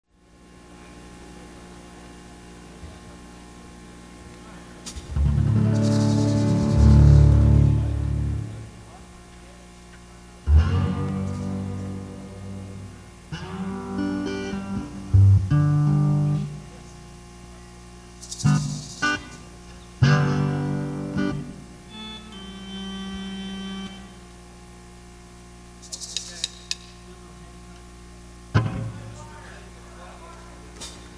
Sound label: Tuning